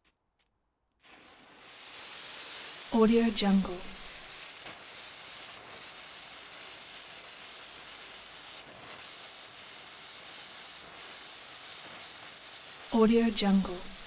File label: green safety fuse burn; collanderson